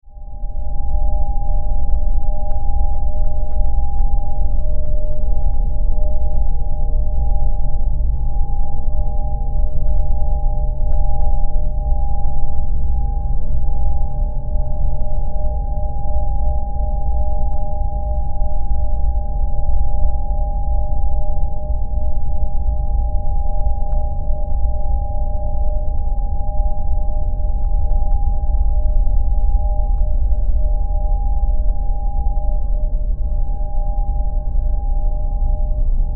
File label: Drone, Energy, Source, Flutter, Scifi; 5.1